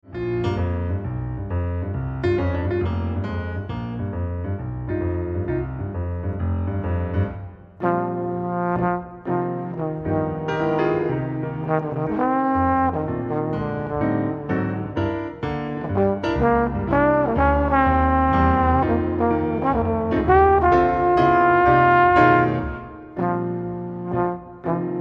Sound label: trombone